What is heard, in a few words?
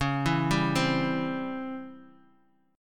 C sharp Minor Major 7th Flat 5th Guitar Open D